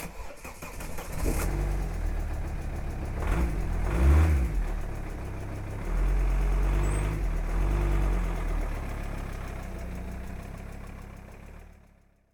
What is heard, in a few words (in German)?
Auto Motor starten